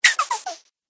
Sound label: Cartoon Pumping Sound; Cartoon Sound Design Sound Effects